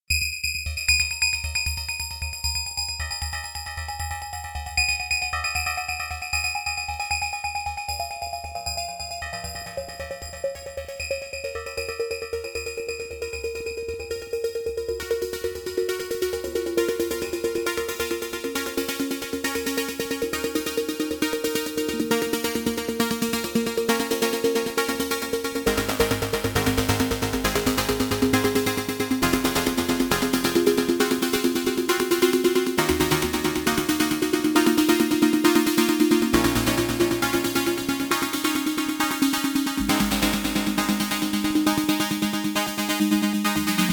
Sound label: Solaris HPF Sequence